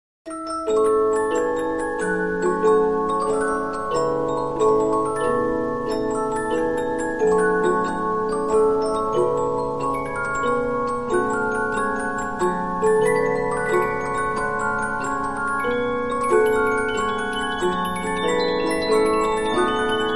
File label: Polyphon, 15inch disc musicbox